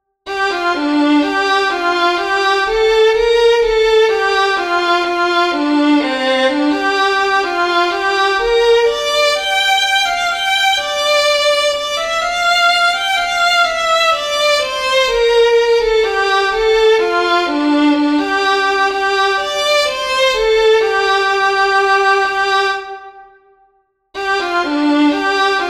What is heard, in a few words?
Slow Air, fiddlers; MATTHEW GILL; southern kings fiddlers